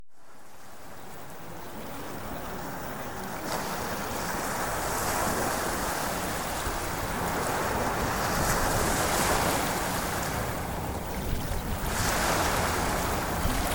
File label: Ocean Waves; HOLLYWOOD SMILE